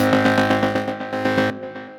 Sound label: SQR Arpy, Synth Rhythmic; Ableton; Factory Packs; Synth Essentials